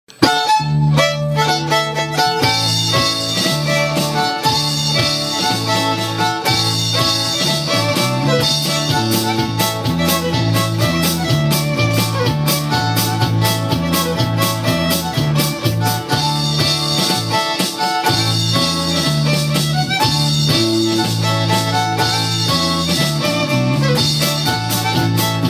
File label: heel and toe polka